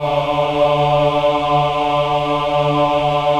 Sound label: VIB D3, VIBRATO, Voices; Spectrasonics